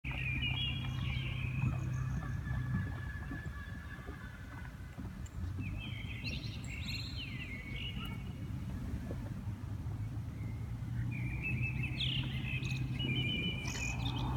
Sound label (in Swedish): tidig morgon i venedig